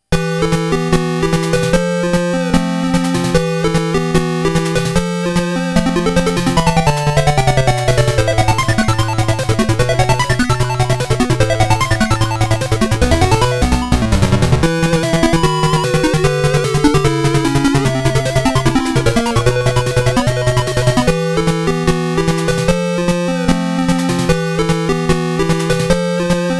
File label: Forest